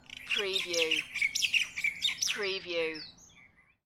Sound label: Honolulu Morning Bird Close Up Short